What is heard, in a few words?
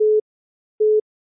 call waiting; iOS Sounds